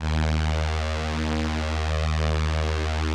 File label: VP-330, String Machines